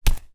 foley footstep single barefoot tile internal; zapsplat

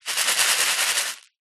leisure fuse beads shake in plastic lid

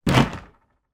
foley storage box plastic bash into

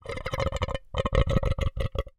human throat suck in air gurgle

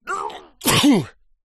human male sneeze

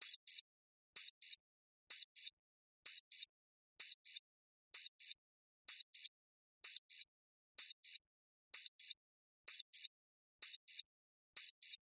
household watch digital alarm high frequency beep

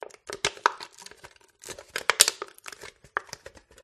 pub can crush; field reports

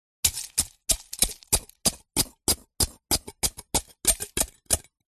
ice block chip away with small hammer or knife; zapsplat

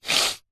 human male middle aged sniffing

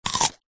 human eat bite carrot raw crunch single; zapsplat

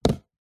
cardboard shoebox empty set down; zapsplat

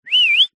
human whistle mouth short; zapsplat

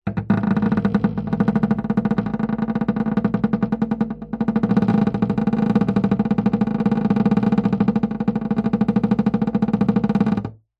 wood hollow vibrate fast object; foley; zapsplat

